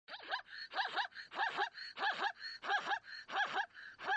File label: Zebra Bray Ringtone